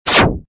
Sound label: lose; itgmania; stepmania; LifeMeterBattery